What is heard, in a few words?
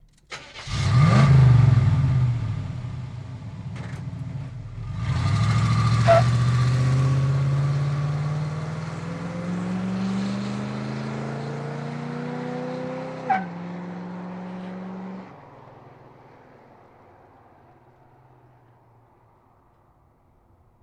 Blazer Strt Out Fst, chevy blazer